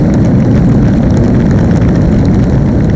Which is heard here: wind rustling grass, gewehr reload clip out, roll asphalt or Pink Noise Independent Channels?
roll asphalt